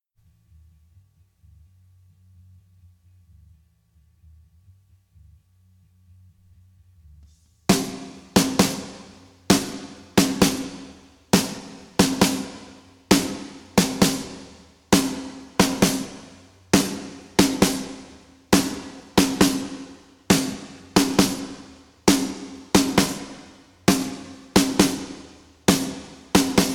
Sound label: drums